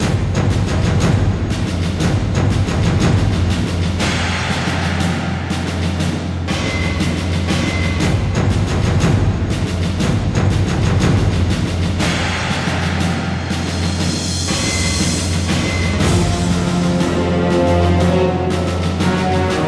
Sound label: music